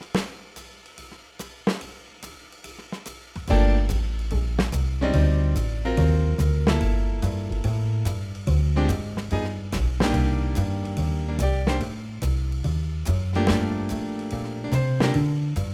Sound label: Jazz Improvisation Backing Track